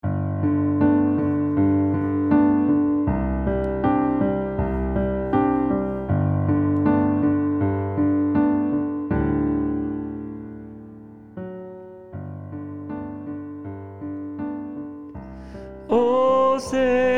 Ose Shalom DESCANT

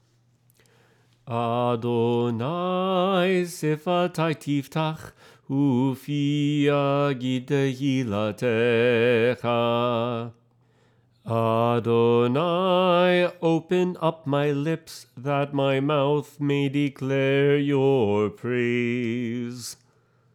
Adonai Sfatai Tiftakh Sung

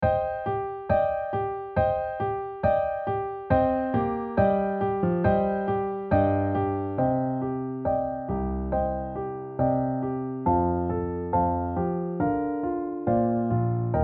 HIGH VOICE; Bayom Hahu

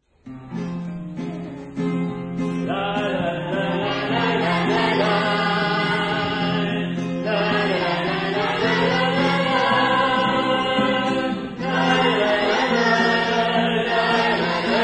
Barkhu

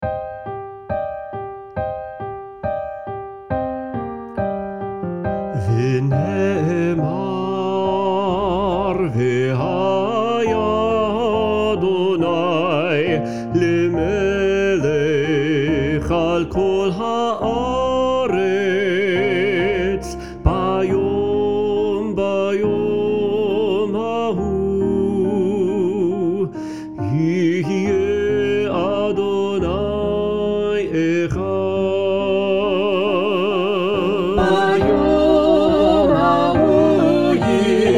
Bayom Hahu

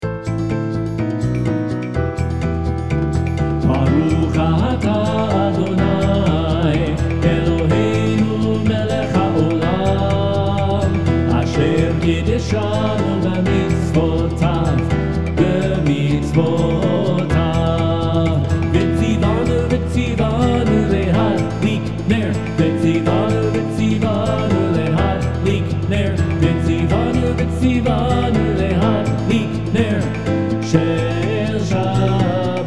Candle blessing

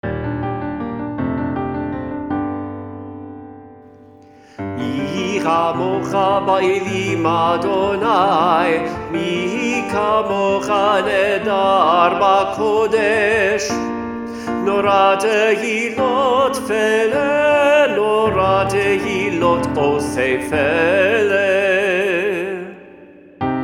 HIGH VOICE; Mi Khamokha

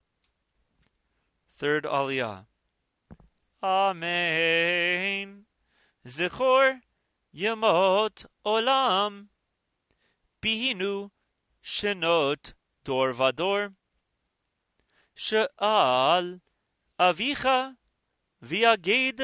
Haazinu Aliyah chanted

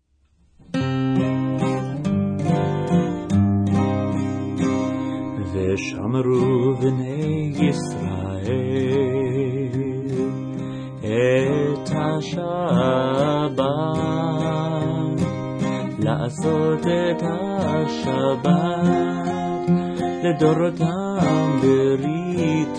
Vshamru FOLK

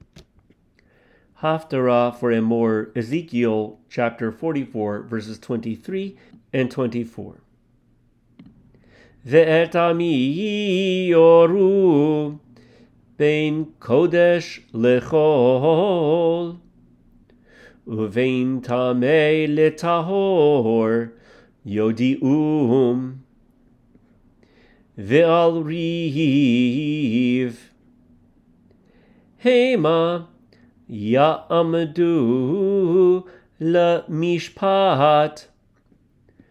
Parshat Emor Haftarah

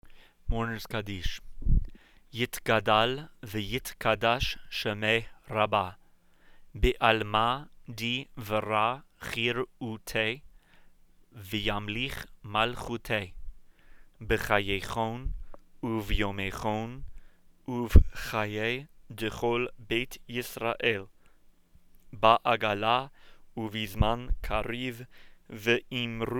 Mourners Kaddish

